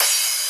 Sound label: cym